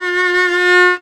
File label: VIOLON F#3, Arabian Traditions; USB Soundscan vol.43; AKAI